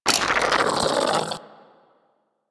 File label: Sapient Pistol Reload; Warframe